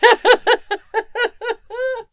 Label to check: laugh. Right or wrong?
Right